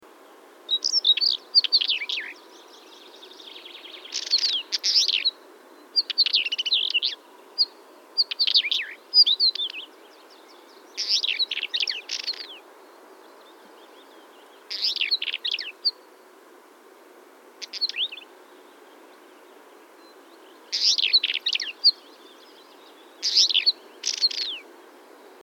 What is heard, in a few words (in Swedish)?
Stenskvatta